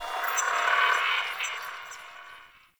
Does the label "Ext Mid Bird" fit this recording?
Yes